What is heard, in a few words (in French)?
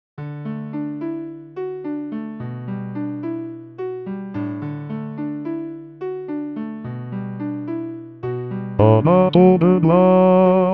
Baryton